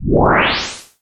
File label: whoosh